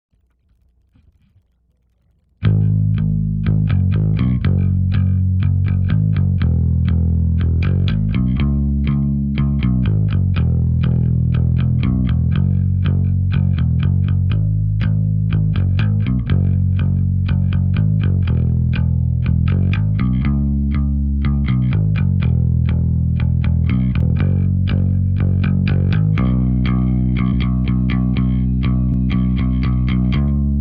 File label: rhythm